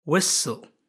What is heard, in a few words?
whistle